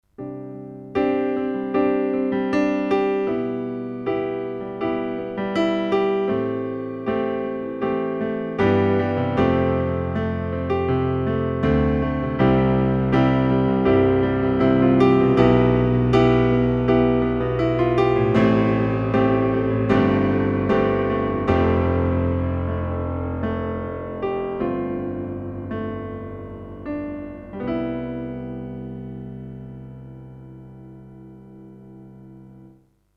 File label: Casio PX-S7000 Privia Grand HG